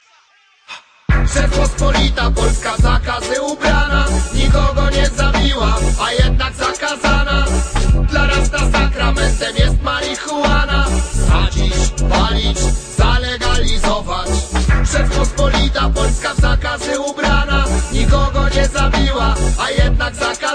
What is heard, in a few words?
Protest